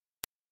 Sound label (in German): kein Playback, a cappella